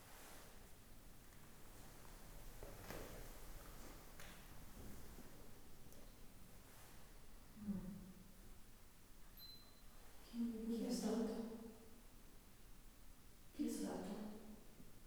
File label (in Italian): possibile mugugno sviluppato nel salone delle feste